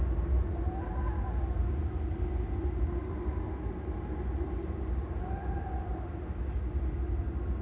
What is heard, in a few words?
WIND GENTLE CAVE